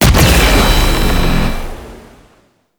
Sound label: Defense Speaker Burst; Warframe; Nineteeen Ninety Nine